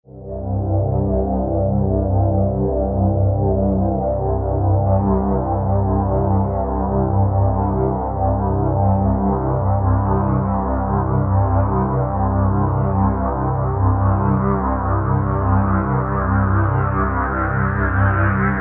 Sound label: Drone Dark Feedback Low Synth Pulse Envelope; Blastwave